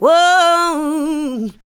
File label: ADLIBS 90; Ueberschall; Jam Box